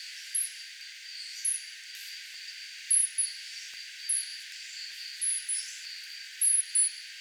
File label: Cebuella pygmaea short; Pygmy marmoset; The pair mates call-over with a hardly detectable short high-frequency calls